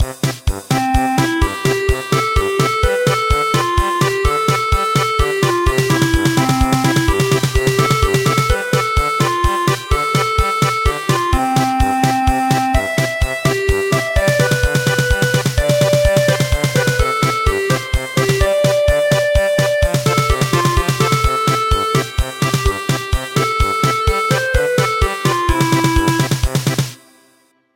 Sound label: German Polka